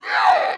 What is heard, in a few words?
Knights Templar Attack; Playable Tutorial Level